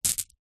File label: foley gemstone jewel single down on others